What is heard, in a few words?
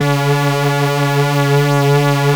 PWM C#4, Korg PS3100; Back In Time Records; Korg Universe VOL-1